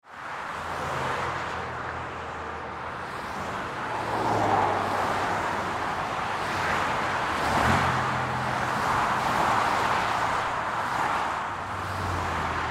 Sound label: Ambience Traffic Highway Constant; Traffic and Cars (Sound Effects:Backgrounds)